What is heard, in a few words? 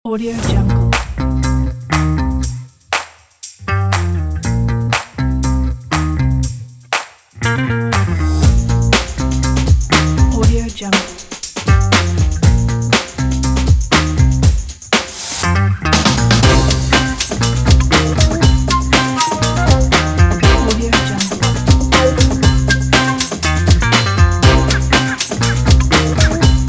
cooking